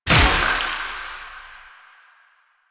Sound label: Explosion; Warframe; Weapons; Moa Pet; Multron